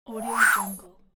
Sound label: magic whoosh